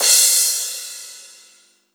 Crash, Cymbals; Minimal House

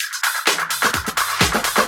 Minimal Fills, Minimal House; Minimal Loops

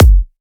Kick, Psytrance; One Shots

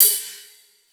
Ride, Cymbals, Drum Shots; Psytrance; Singomakers; Psytrance Mantra

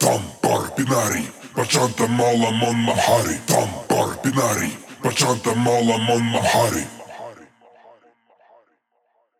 138 Shamanic Voice (Wet), Psytrance

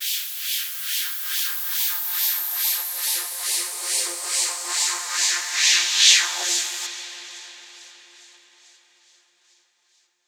Noise FX; SFX

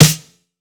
Snare, Psytrance; One Shots; Claps Snares

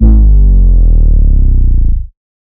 falling bass; SFX

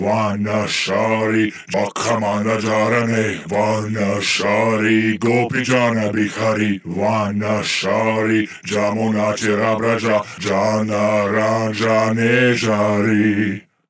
138 Shamanic Voice (Dry), Psytrance

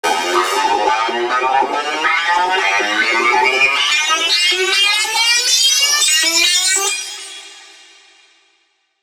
140 BPM sweep up 4 bar; Psytrance; SFX

